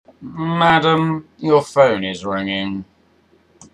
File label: ringtone